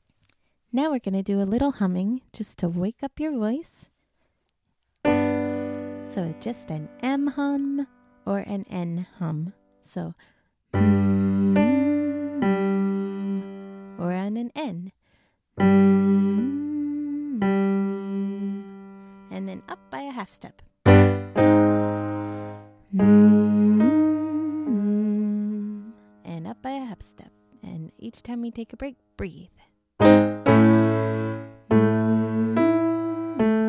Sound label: Vocal Warm Up Hum 1 5 1